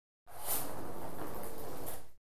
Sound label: Ping Pong Ball Roll